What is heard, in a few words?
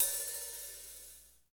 HH, Real Kit